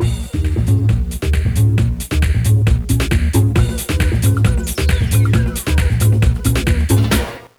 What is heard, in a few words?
Music Soccer; big league sports summer; nds